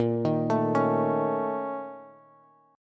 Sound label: Bb Minor Major 7th Double Flat 5th, Bass; arpeggiated; chord shape; 18,18,19,18